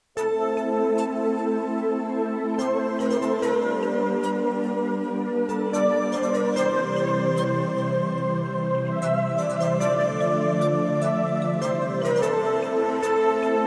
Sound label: remix turkish music deephouse instrumental